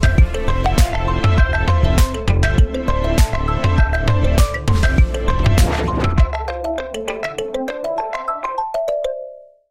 Oppo Ringtone

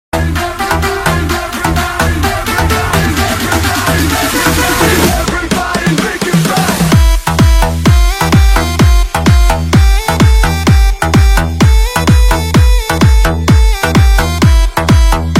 Ringtone Bass Boosted; Samsung

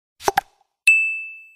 Android Notification Sound Lg Ringtone

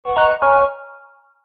Carnival Notification Ringtone

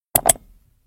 Unique Notification Tone; Whatsapp

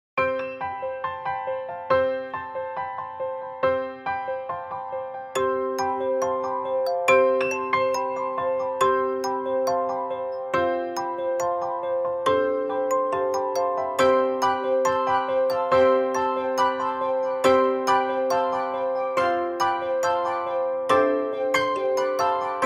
Original Motorola Love Ringtone

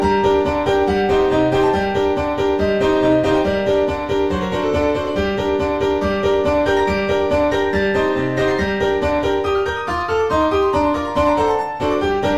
Hungarian Dance Original Motorola Ringtone

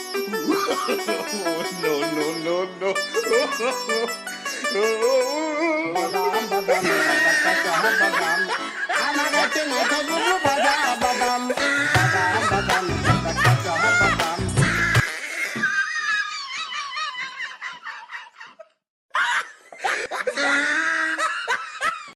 Funny Ringtone

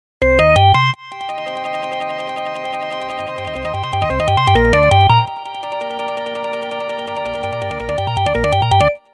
Htc Ringtone; Invention

